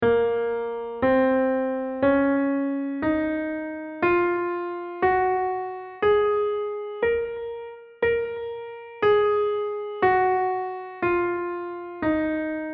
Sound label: Aeolian; ear-training